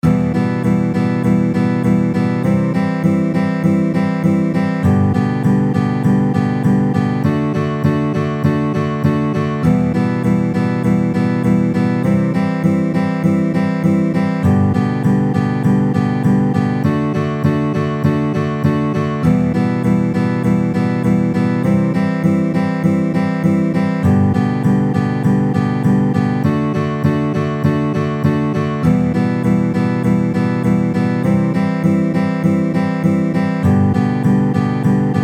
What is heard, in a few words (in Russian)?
Boj Dvojka na gitare 100bpm